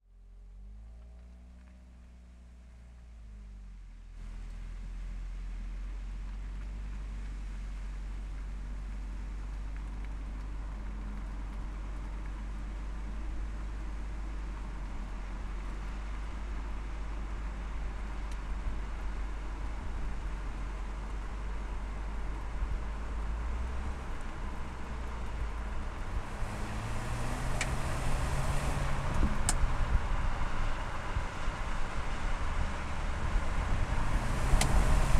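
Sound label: Toyota CHR Turbo Onboard Slow Drive Short Stop Exhaust Distant; DPA4062; Toyota C-HR Turbo 2017; Pole Position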